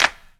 Claps; Vengeance Samples

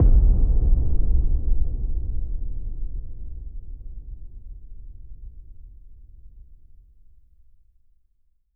Boom FX 140BPM; Sound FX; Vengeance Samples; D minor

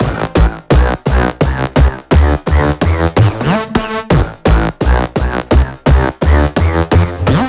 128BPM Melody; Vengeance Samples; VFH1 128BPM Meatball Kit

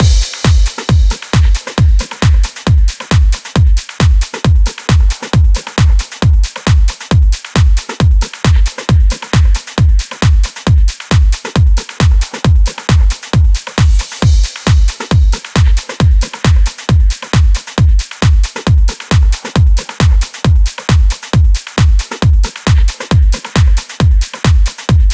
135BPM Drum Mix 16 bars; Drums & Perc; F#minor

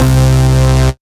Bass C, Offbeat Bass

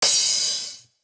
Crash; Vengeance Samples; VES1 Cymbals

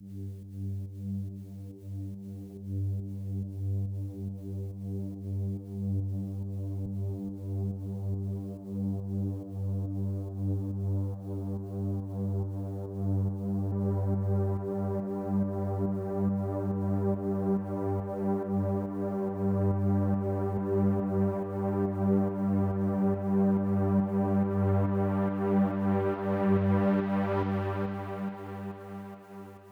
140BPM Pad Intro, Gminor; Unlooped; Vengeance Samples; VTS1 Devastating Truth Kit

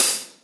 Open Hihat, Cymbals; Vengeance Samples

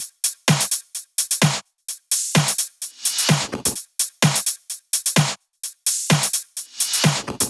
128BPM Kit; Vengeance Samples; VFH1 128BPM Meatball Kit

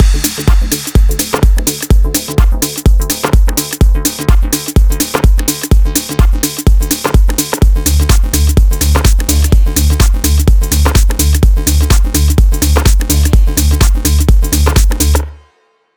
126BPM Mix, Tech House; Connectors; Vengeance Samples; Bonus TechHouse Kits

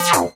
Synth Shots; Vengeance Samples

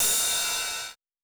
Cymbals Ride; Vengeance Samples

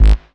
Synth Bass; Vengeance Samples; VEE Synth Shots

